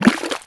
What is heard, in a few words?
fish flap; ambient; dota